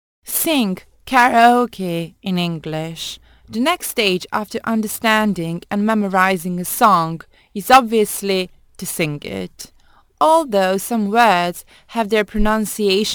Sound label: Sing karaoke in English